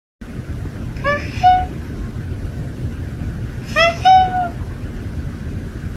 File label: ha hee cat meme